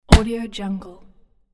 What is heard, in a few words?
catch baseball